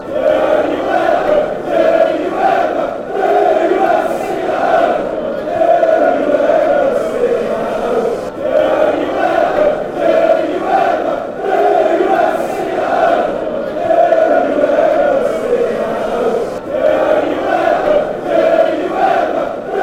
sing at home, fanchants

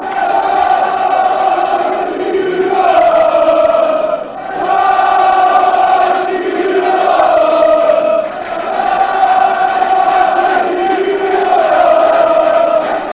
come you dons slow, fanchants

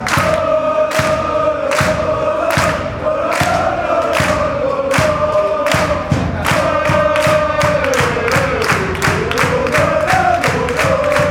lololo lololo, fanchants

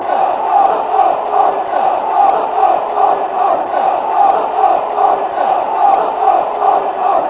off off off oxford, fanchants

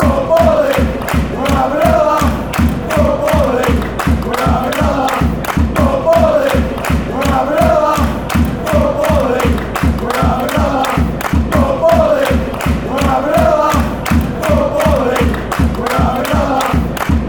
football league you're having laugh, fan chants

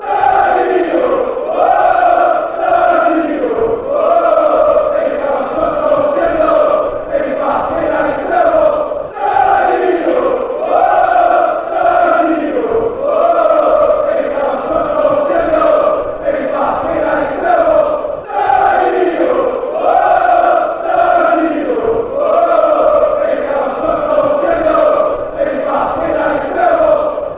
semedo woah, fanchants

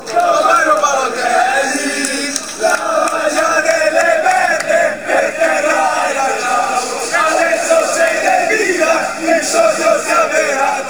coro, fanchants; balotelli

